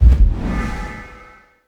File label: HUD Ability UI Ability Ready One